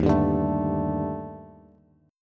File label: D sharp Major Sharp 11th D sharp Bass Bass Standard, chord; strummed; JGuitar